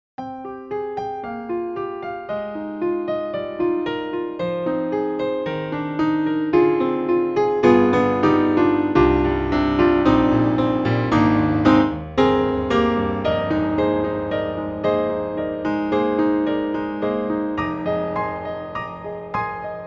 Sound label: Italian Grand Resonant Studio 10 Grand; Synthogy; Ivory II